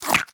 狐狸进食声

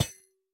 铜格栅踩踏声

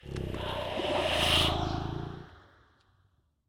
不祥试炼刷怪笼环境声; ambient

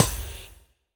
试炼刷怪笼放置声

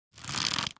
弩快速装填二阶声

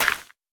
可疑的沙砾放置声

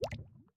滴水石锥熔岩滴落声

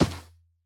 骆驼沙地脚步声

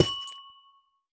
紫水晶破坏声

